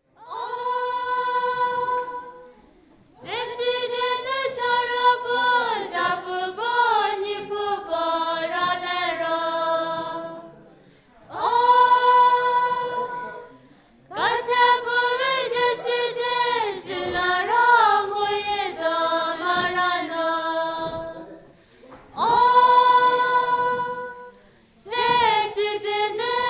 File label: song